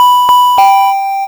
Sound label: notification ding dong